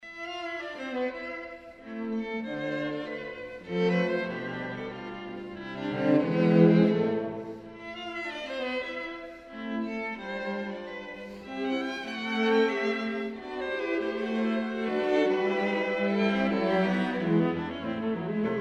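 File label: string trio; fuchs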